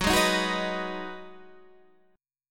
B 6th F sharp, guitar chord

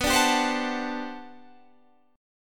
G Major 11th B

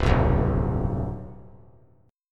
C Major 7th Suspended 2nd Suspended 4th, chord; CM7sus2sus4 strummed; 0,0,2,0; JGuitar

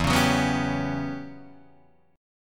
E Minor 6th Add 9th, Guitar, DADGAD

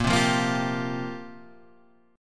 F Suspended 2nd Suspended 4th, A sharp

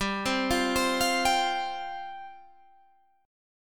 G 7th Suspended 4th G down Guitar Half Step Down

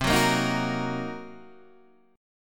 C Minor 7th Flat 5th Guitar DADGAD, chord

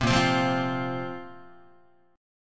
A sharp Suspended 2nd, Guitar; strummed; 3,6,3,3,4,4; JGuitar

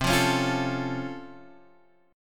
Db Augmented Major 7th, C

